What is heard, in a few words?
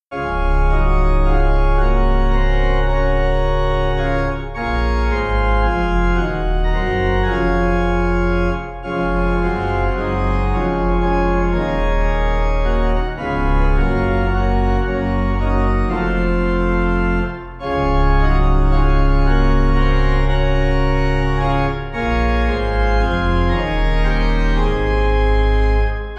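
Pipe, Pipe Organ backing; Come Unto Me; Bentley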